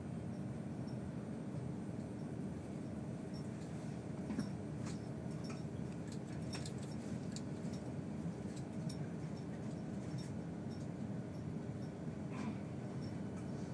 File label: high speed train